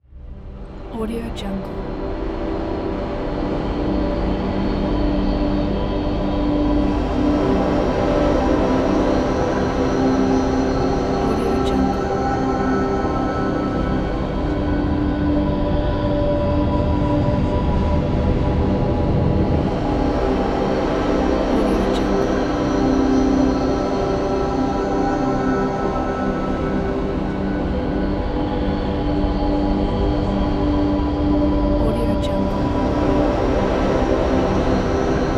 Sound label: cinematic ambient drone